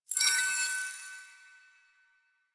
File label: Choir Chorus End; Warframe